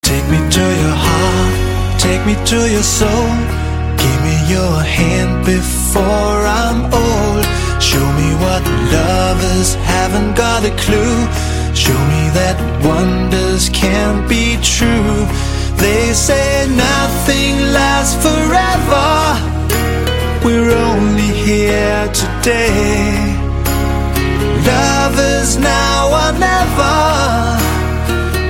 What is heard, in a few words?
love Ringtone